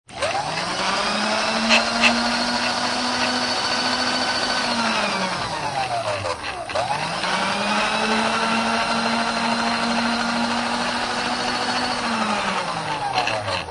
Small belt sander, industrial grinder. Start up, run at various; stop and wind down; Machine